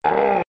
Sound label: hit; king k rool